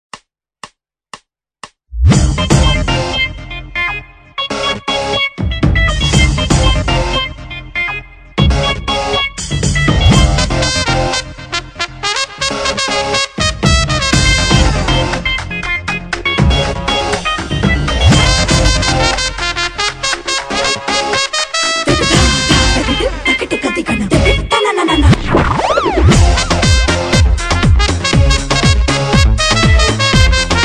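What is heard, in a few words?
Title Song Instrumental; Tv Serials; Zee TV; Dance India Dance 3; DID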